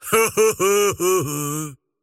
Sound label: laugh; dota; largo